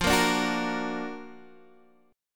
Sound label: Gb Augmented Major 7th F